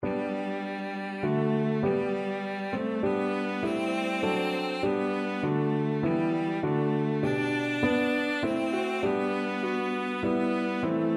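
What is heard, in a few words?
violoncello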